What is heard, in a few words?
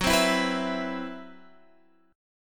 C Major 7th Flat 5th Gb, chord